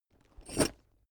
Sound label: backpack metal single